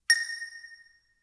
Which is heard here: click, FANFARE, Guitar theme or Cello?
click